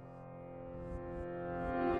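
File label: piano Room reverse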